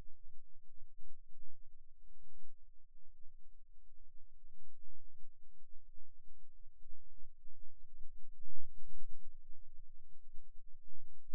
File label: Rhythm Seizure, eeg